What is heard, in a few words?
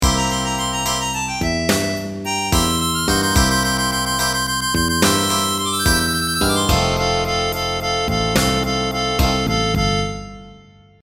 bar room blues, loops